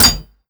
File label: step layer clink, soldier charger; combine